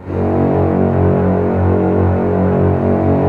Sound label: ORC F#0 FF, Orchestra; ORCH C0-C2; Sound On Sound - Peter Siedleczek's Orchestra